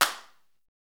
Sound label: PRC XCLAP, Hand Claps